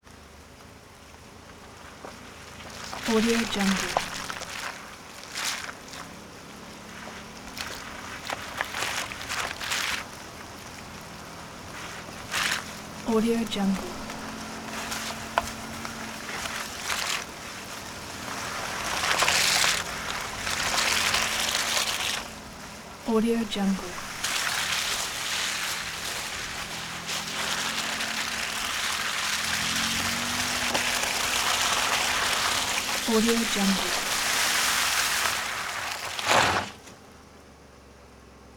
vehicle through mud recorded close to tire slow driving